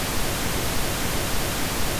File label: Pink Noise